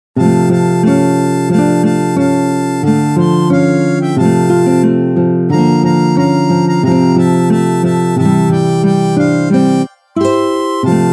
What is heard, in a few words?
Jingle Bells Harmonica